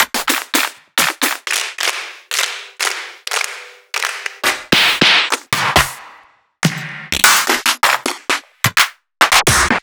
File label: Claps